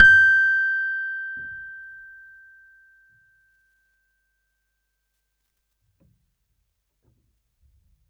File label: Valved G5